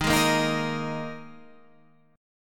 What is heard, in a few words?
Eb Suspended 4th Eb Guitar Standard; Ebsus4 strummed; JGuitar